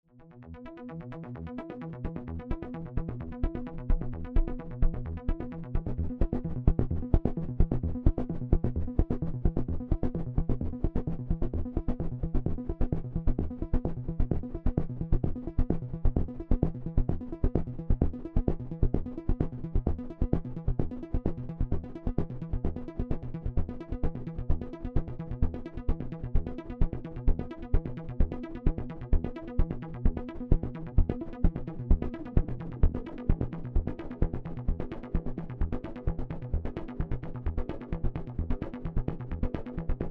cs80 arp

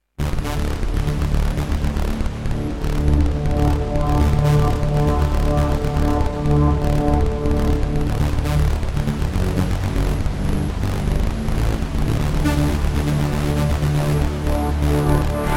Trance Shower

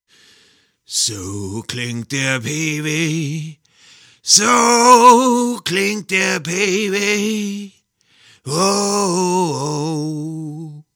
Peavy C3000

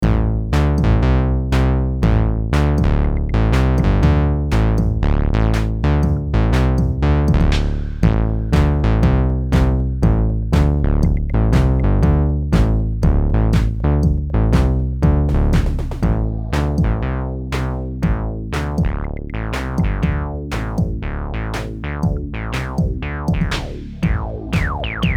SH2 Original

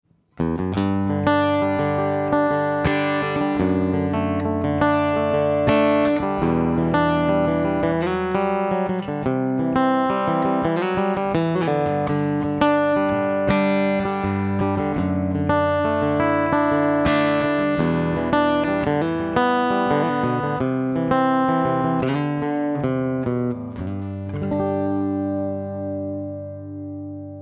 LoFi

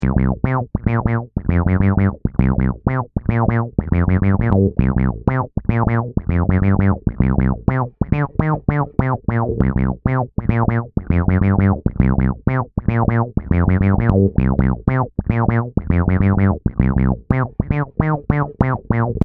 bottom syn

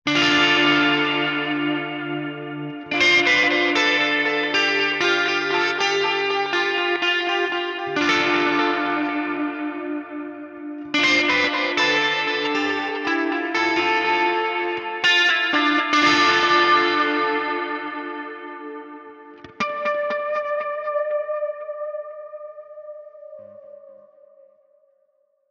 Mesa Boogie Delicate Touch; Neural DSP

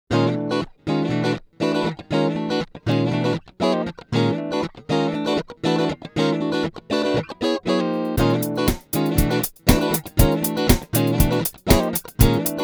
Sweep Filter Funk Rhythm

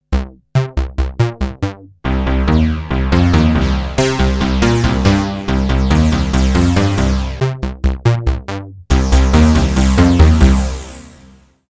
PPG Whizz vs Microwave; Fireball

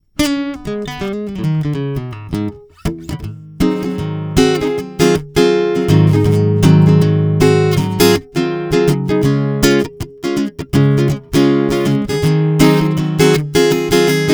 Resonator Guitar

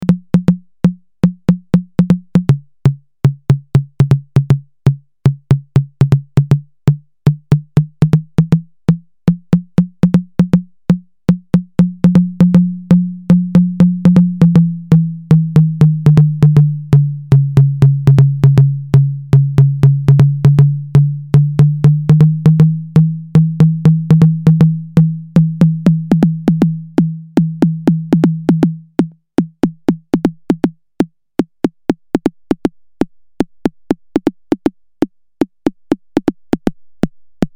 TB2 CONGA

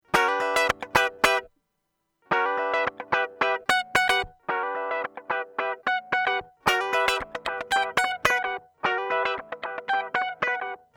Very Long Delay

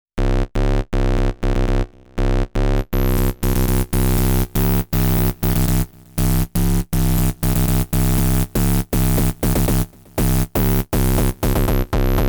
dub bass sound patch; syntakt workshop

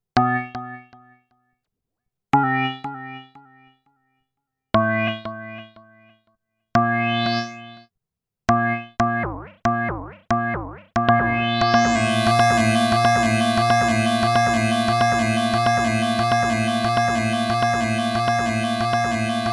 Korg NTS 1 Delays

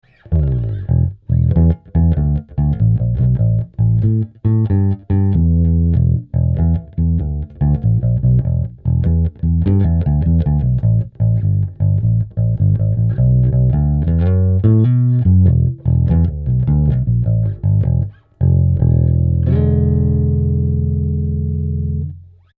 P Bass Finger

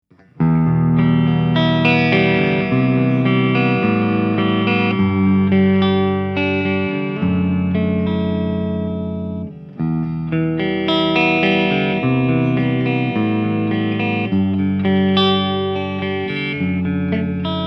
Dean Guitars ML Oceanburst Clean

